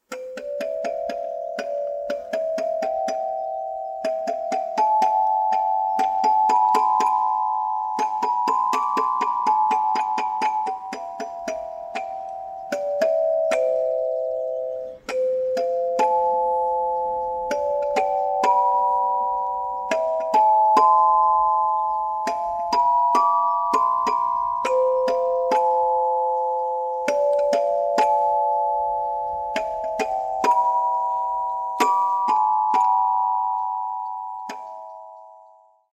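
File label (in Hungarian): marimba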